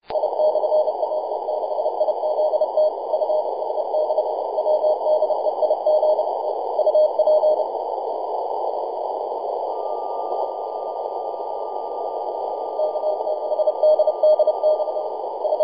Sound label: 18.072 mhz cw; fs w6izt